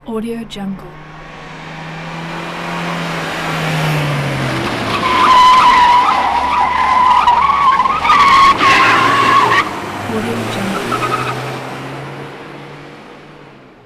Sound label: volvo 740 gle in fast; ترمز شدید ماشین در سرعت بالا; industrial sounds